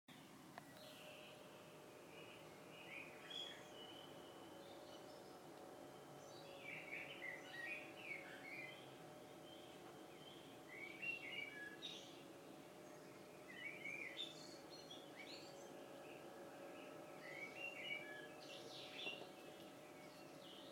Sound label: blackbird